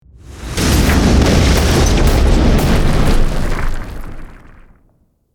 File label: Aerial missile strikes bombardment explosions